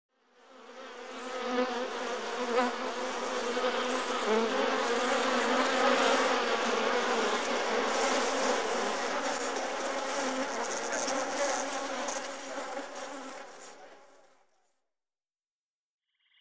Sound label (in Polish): odgłosy łąki efekty pszczoła żaba bocian świerszcz mucha komar